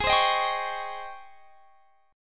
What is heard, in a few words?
G sharp Major 11th; JGuitar